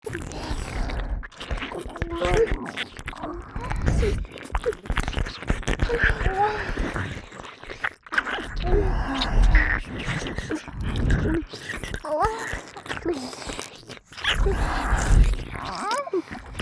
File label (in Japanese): Wディープキス耳舐め, ASMR; 夕恵＆夜恵